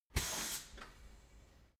tire changing machine hydraulic separator short burst